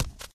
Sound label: concrete, footsteps walk